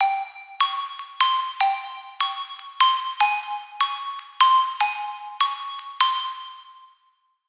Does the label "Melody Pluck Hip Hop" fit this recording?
Yes